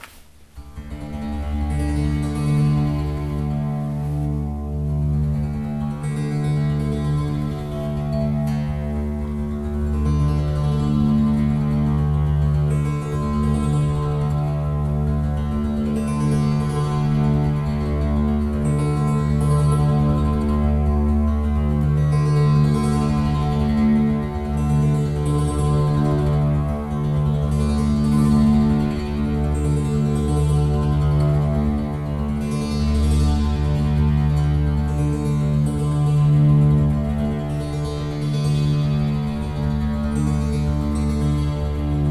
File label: MonoChord